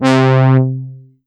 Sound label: Kawai K3 Brass C3